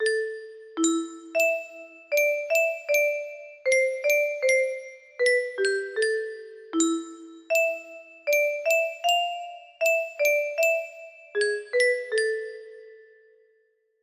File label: musicbox